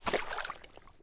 swamp walk; Escape from Tarkov

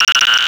hud pod drop

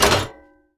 shelves deploy; Rust

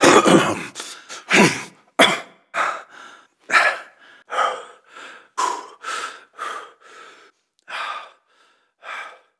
cough run; ModernWarfare

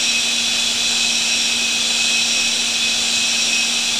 P-51D cockpit gyro, aircraft; DCS

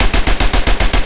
loop vickers303 origin; guns tanks; War Thunder

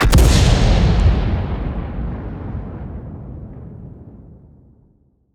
impacts; World of Tanks

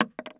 Inf Weapons; Red Orchestra 41-45

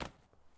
bandage drop; Escape from Tarkov

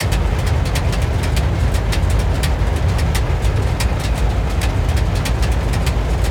damage; World of Tanks

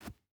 footstep hide sand jump start; Rust

